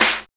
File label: RECKLESS SN; BONUS SN2